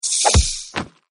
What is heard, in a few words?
snake strike; Legends of the Hidden Temple - Unlock the Past